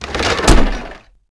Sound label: Catapult Fort; Civ4 warlords